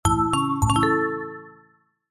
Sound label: multimedia alert musical warm finish complete; zapsplat